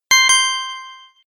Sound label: Double Ding, dong sound effects; multimedia